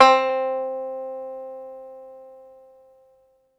c2, Banjo, MIRAGE; ProSamples vol.55 - Retro Sampler; Banjo + Fiddle

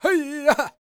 powwow, World Voices, Lakota

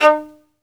Synclavier Strings, SOLO VI; ILIO

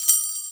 SHAKER, INDIAN PERC

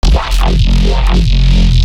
Growl Bass; neuro; BIG5AUDIO

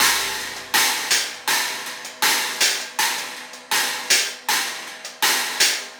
Cymbal and Hi Hat

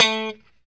POP A 3, STRAT guitar; Spectrasonics; Hans Zimmer Guitars VOL-1; JC-STRAT POP

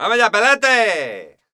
flamenco vocals, male; Best Service ProSamples vol.46

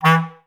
BCS STAC E2; Best Service; Peter Siedlaczek's Advanced Orchestra

